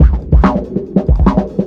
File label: FILTER, Big Beat Drumloops; FILTERS; AKAI